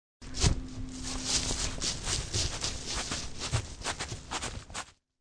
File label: Rn Skp Lv, Running and skipping through autumn leaves.; Part of Running (Sound Effects:Human)